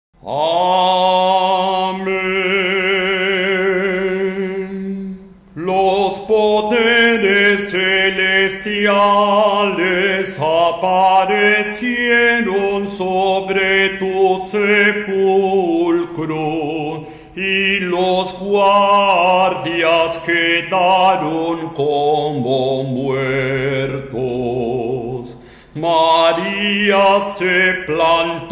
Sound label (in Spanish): Tropario de resurreccion tono 6 cantor, iglesia ortodoxa; ecuador